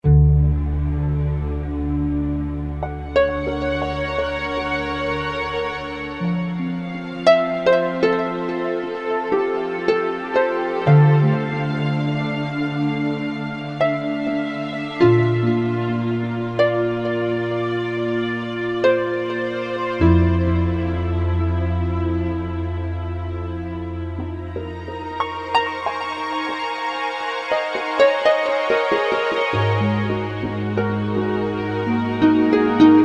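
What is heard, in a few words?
Melancoly Harp, Roland SR-JV80 Orchestral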